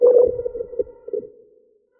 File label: Watcher Idle; Watcher (Legacy)